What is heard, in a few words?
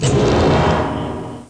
Red Death; Icebreaker Demo; NewREV